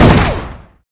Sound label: shoot; PC Gamer IT CD 48; Big bang